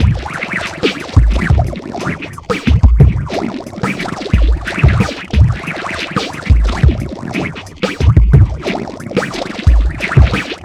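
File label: Annoya Loop, beats; Sonic Foundry (Sony Creative Software); Esoterik Beatz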